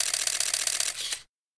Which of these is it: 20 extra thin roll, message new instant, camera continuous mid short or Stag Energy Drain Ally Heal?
camera continuous mid short